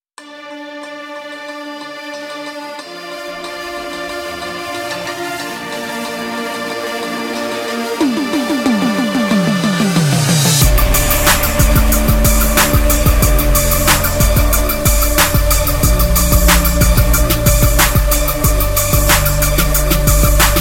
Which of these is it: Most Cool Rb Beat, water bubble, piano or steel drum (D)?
Most Cool Rb Beat